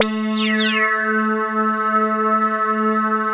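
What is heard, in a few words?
OBERHM OBXA, Oberheim OB-Xa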